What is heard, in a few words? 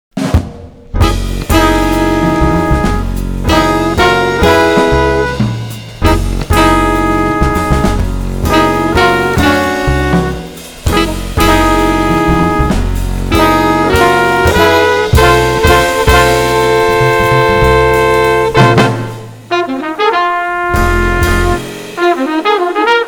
Blue Ambiance